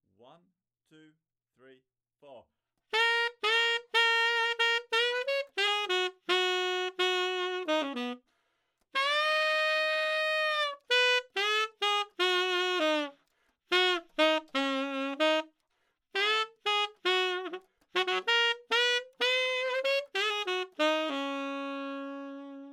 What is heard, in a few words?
Slow easy version